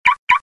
car alarms

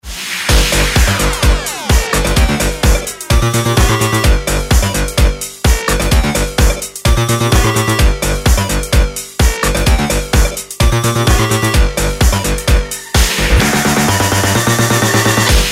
club; Dirty south

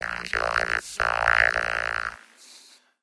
Robot

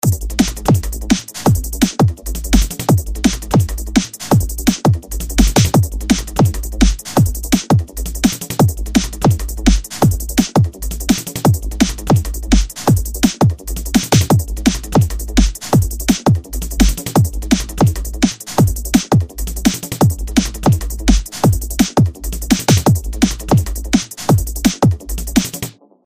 BEAT

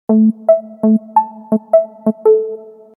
sms and mail; Короткий звук на СМС.

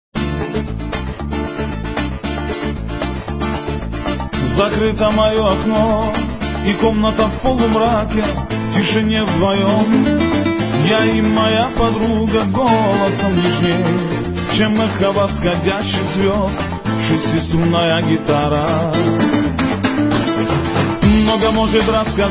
gitara

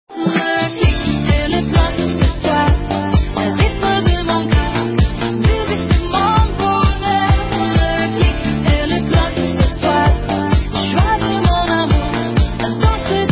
Click Clock

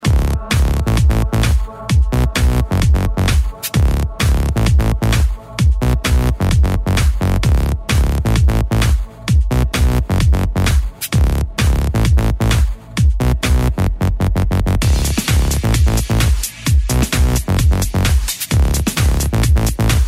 filterfunk

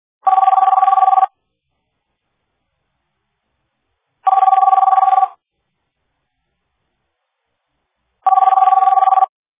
office phone

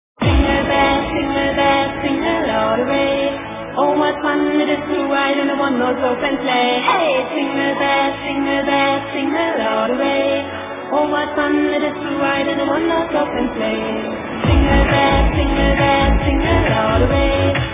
Jingle Bells Dance version

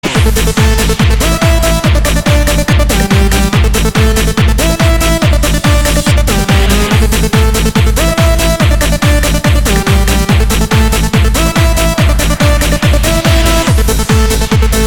TEHNO ROCKER

